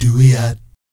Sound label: DOOEEOT, Vocal Jazz Fx